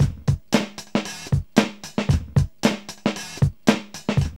STUTTER 114, Funky Elements loops; Zero-G

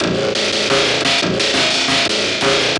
Loops, breaks; M8

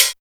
HAT-LIKE; TEK PERC1; Drums In Your FACE!

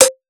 Hats, Alesis Performance-Pad

